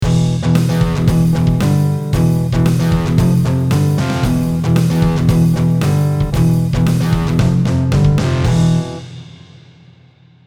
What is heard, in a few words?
Wurly Organ Power Chord Groove